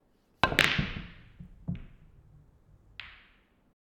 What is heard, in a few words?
Snooker Shot